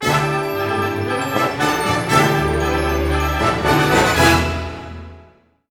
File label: HIGH, ORC Highland; East West - Scoring Tools